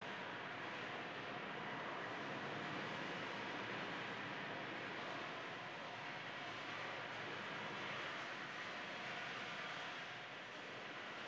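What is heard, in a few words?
Crane Train ambience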